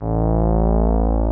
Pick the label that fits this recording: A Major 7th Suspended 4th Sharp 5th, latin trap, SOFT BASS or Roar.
SOFT BASS